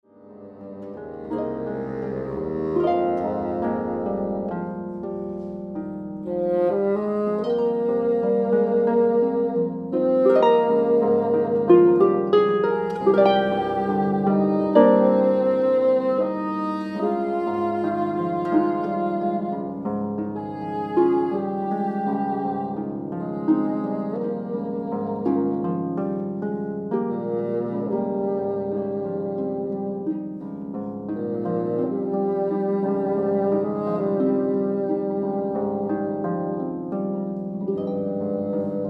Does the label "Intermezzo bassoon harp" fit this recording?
Yes